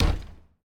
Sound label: Street Ball Glass Back